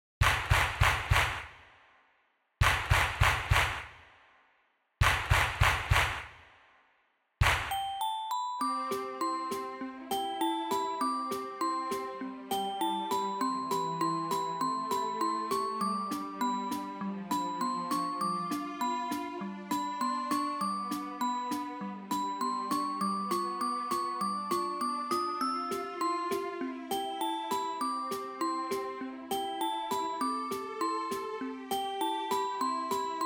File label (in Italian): Arpiccola Strumentale